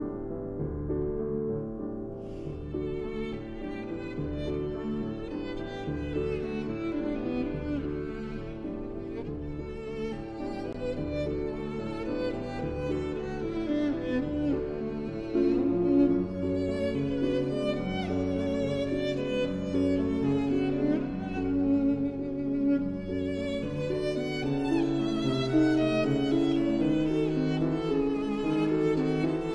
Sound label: Violin and Piano in D